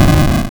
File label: Explosion